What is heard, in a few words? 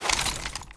draw rifle; weapons; m1918